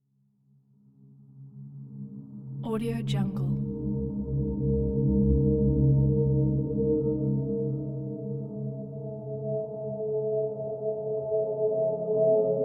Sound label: mystic ambience transition